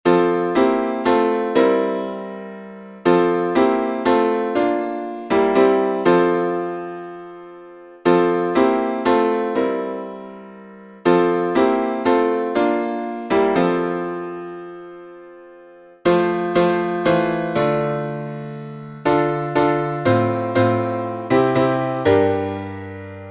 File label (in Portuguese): salmo playback